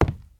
ladder, step

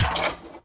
walk, mob iron golem

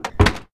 chest closed

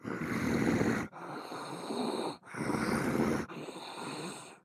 zombie pig

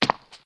stone, step

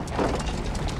minecart, inside

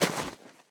snow, step

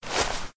grass, step

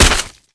blunt hit; matmos interactions

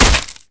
blunt hit; matmos interactions

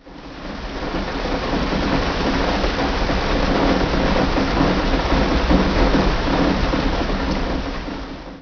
rain on window; matmos hl; weather

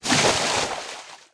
water jump in; matmos interactions

